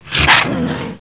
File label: dino alert